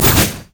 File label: spiritrush; LoL; ahri